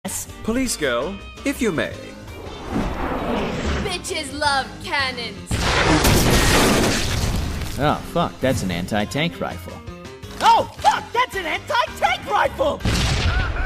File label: anti tank rifle